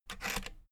rotary control plastic rattle turn back

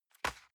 envelope drop medium slide

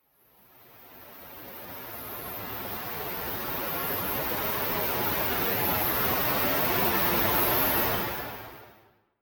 wind wave

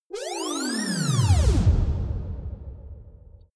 outer space shuttle signals

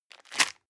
plastic bag small grab hard

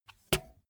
bmx bike tire foot scuff short

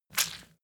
leather impact mud soft squeeze

